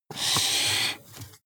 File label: marker mark soft